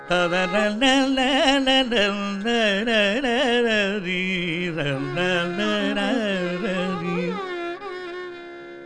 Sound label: carnatic